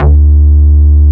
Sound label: Bass D, Root D; Vengeance Samples; Vengeance Ultimate Bass